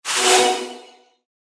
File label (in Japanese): 薬草